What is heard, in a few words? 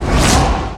Menu Exit; Menu FX